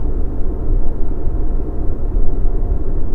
hall amb; Quake 4 Alpha; id software

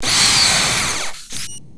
retch servo pain, strogg; Quake 4 Alpha

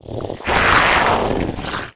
imp sight, monsters; Doom

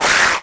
imp chatter combat, monsters; Doom

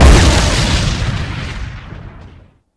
tank missile exp, strogg; Quake 4 Alpha; id software

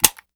Pistol dry fire; Foley; Doom

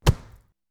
mush head hit, Body Impact; Doom

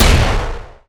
blaster; Quake 4 Alpha; strogg tik

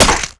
Juicy Bullet hit, bullet impact flesh; DoomRTX

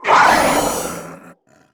imp die, monsters; DoomRTX

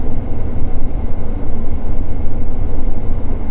briefing ambience; Quake 4 Alpha; id software

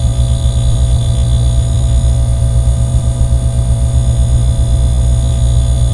Neon Hum; Doom

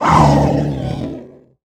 imp die, monsters; DoomRTX; imp5

